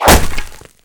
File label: arrow impact wood; weapons